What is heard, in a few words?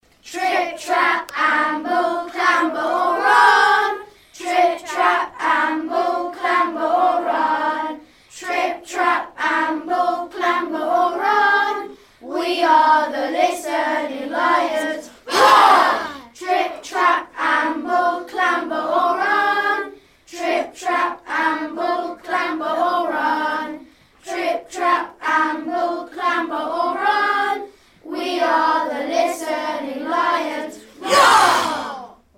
trip trap round primary performance